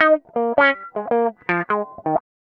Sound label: 080 W-Coast HipHop Guitar Eb